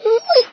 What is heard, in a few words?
whine, dog; There; ThereClient